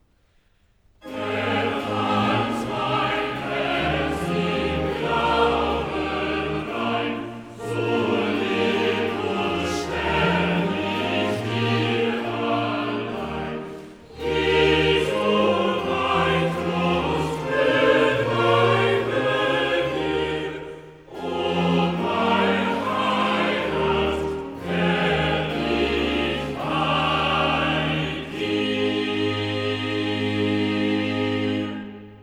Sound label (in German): Choral; Erhalt mein Herz im Glauben rein; Bach; Cantatas; BWV 3; Ach Gott, wie manches Herzeleid